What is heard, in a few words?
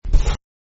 rubbing; balloons-and-static-electricity